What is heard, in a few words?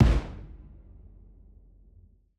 unique stomp; nova sound